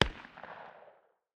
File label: pistol 45 far distance, distant 45 acp; tacz-tweak-pack; taczmechanics